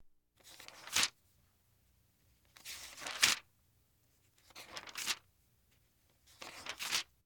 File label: Household Phones